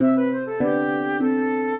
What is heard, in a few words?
trio, flute harp; Mozart